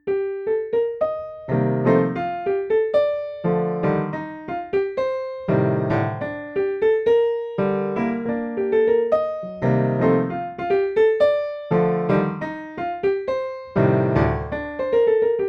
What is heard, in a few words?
Jazzy